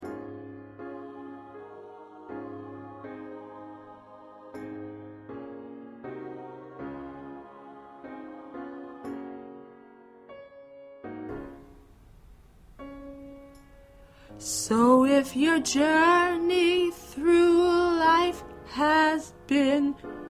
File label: Lead